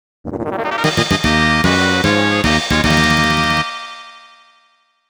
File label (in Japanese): ファンファーレ, SFC Style mix; Final Fantasy I; SFC Style mix feat.FF5